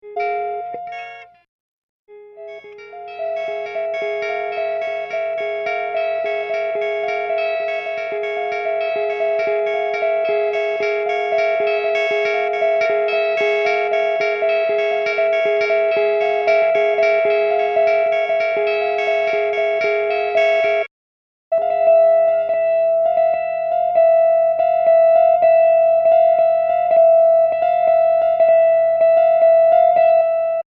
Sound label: guitar